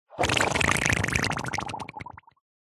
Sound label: human fart long very wet squelchy bubbles; zapsplat